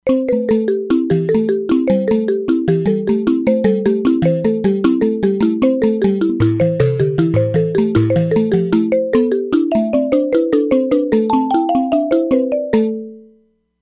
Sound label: Mbira, E-mu Proteus-3; Factory patches